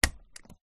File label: Stapler